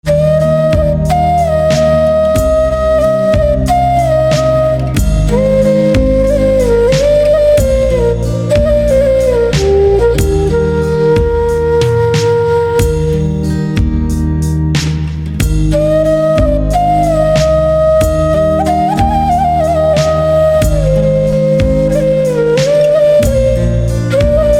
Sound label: Flute, instrumental ringtones